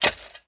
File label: hoe; Immortal